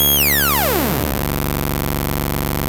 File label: ARP 2600 D2, synthesizer; Hollywood Edge - Giorgio Moroder Rare Synthesizer Collection